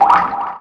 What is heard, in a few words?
aqua elemental stand